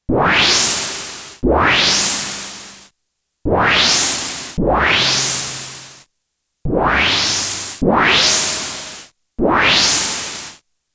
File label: SYNTH DRUM II, Korg DW-8000; Factory Patches